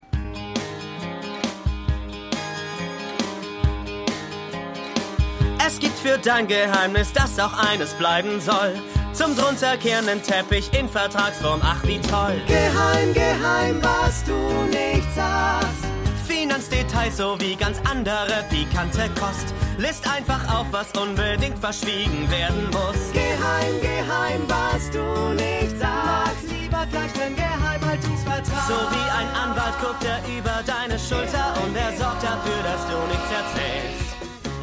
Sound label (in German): Gesang; The Good Fight